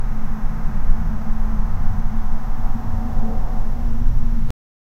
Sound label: desert soundscape with wi